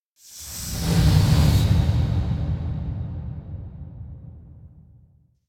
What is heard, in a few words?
Impact Distant Deep Distant Blow Up; Hollywood Edge; sound designer's toolkit v2